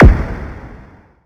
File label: Impact; Vengeance essential club sounds-3